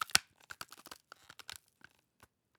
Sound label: texture cabbage hits